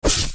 swing stab

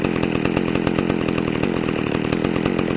chainsaw idle; doom3

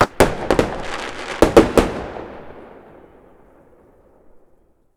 firework pop

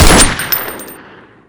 shoot, weapons; 9mm

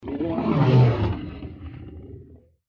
deathclaw pain; npc

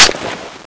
clip out; tfa cso; heaven scorcher

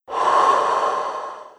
breath

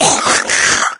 pain, maggot; doom3

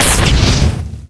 fireball explode

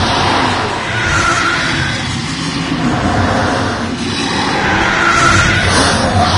charge, lostsoul; doom3

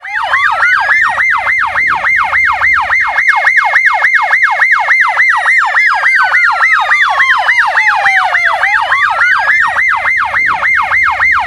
siren, police; madmax

